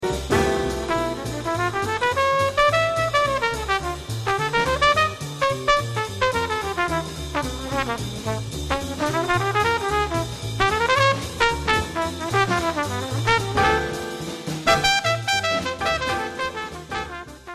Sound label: solo